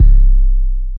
808's

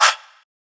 Breath, Vox

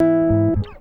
gtr

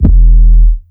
808s

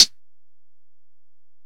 Hit, Closed Hats

